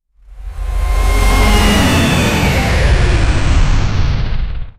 a single cinematic anime, air burst

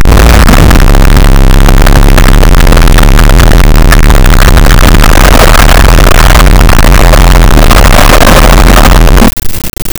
the ambient sound of molt, volcano

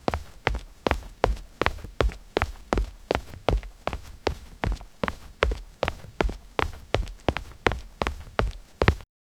cyclical footstep on the

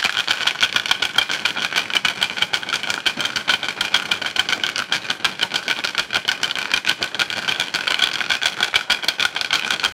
oil barrels shaking

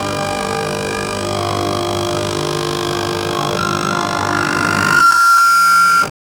coffee machine gurgles up

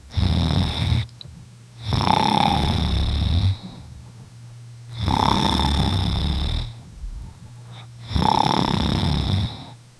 a 17 year old girl is sle, sleep

